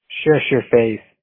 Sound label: Shush